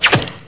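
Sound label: arrow to wood; Hexen2; assassin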